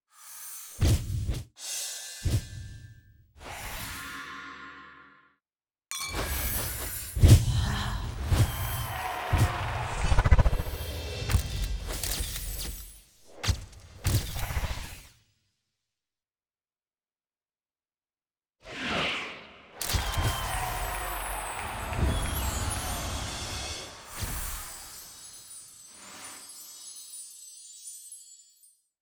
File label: SFX; Destination Divas